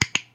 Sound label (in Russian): nazhatie knopki